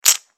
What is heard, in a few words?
placing Chip